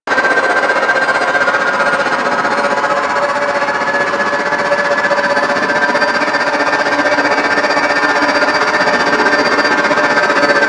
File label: Archwing Healer Engine Loop Fast; Warframe; Vehicles